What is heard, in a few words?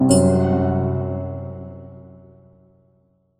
Kiss Catch Enemy; smite 2; Aphrodite